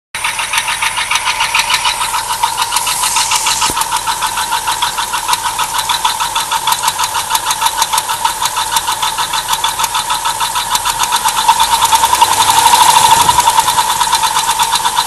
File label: dodge grand caravan engine noise